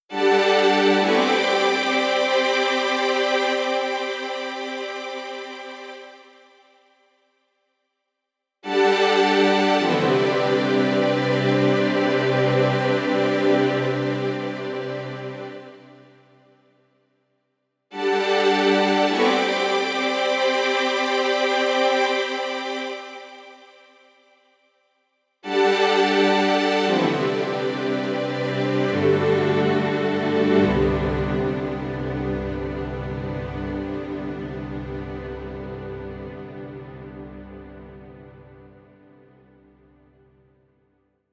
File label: Strings; VIVO H10